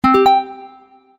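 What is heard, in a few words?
BlackBerry Emphatic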